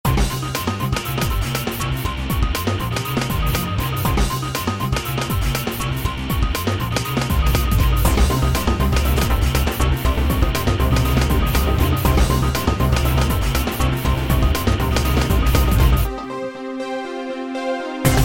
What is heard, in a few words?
Intense Theme